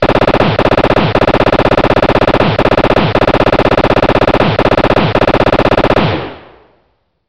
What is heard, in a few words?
gunshots